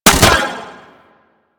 Space Drone Bomb Fire, weapons; Grn Space Drone

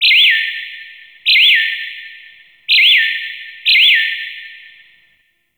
Terrarium Bird Chirp A; Warframe; Ambience; Entrati; IntTerrarium

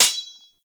Melee Sword Impact Generic, Swords; Weapons; Impacts

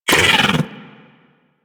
bug vocal pain; Warframe; Hunting Critters; Inf K Drive Bug

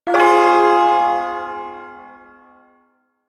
Shawzin Min Chord; Warframe; Duviri Ersatz Shawzin

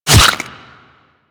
Bullet Flesh, Impacts; Warframe

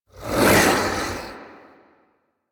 Archon Whip Ranged Attack Projectile; Enemies; Weapons; Warframe; The New War; Nira

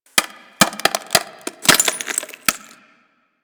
Ocean Glass Crack Small; Warframe; Grineer Ocean

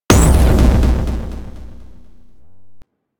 Antimatter Drop Cast; Warframe; Warframes

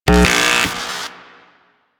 Screen Static; Cephalon Tweacher; Warframe; Quests; The New War

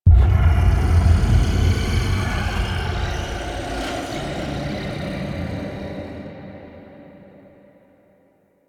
Transmission Start; Entrati Labs; Evil Twin

